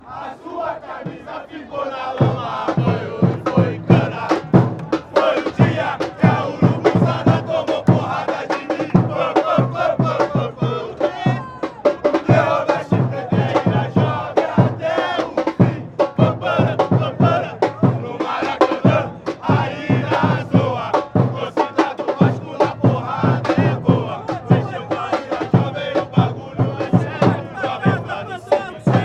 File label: no maracana ira zoa, chants